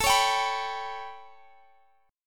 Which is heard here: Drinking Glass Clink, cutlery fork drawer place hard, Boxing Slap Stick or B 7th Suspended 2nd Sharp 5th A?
B 7th Suspended 2nd Sharp 5th A